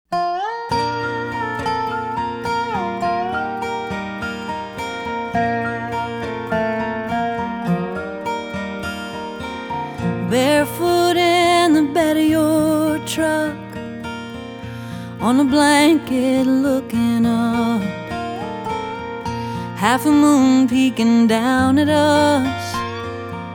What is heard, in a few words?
acoustic